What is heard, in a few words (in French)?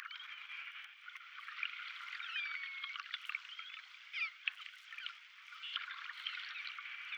voc bruit eau